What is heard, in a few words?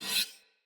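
Dagger Whoosh Accent, Swords; Weapons; Player; Josalfar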